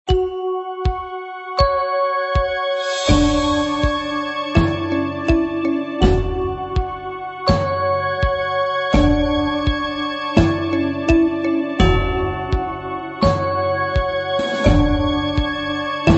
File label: Clock Is Ticking